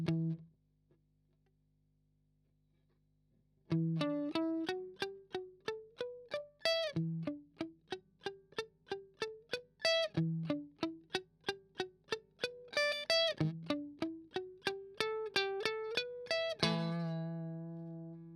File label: Arp